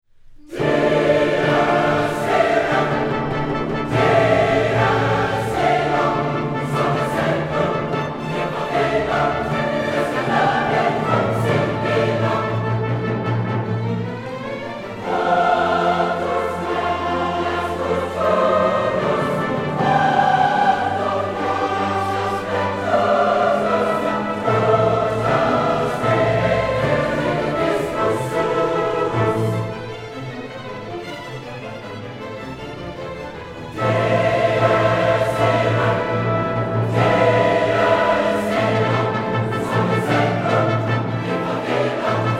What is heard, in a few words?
orch